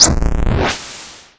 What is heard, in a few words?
nuke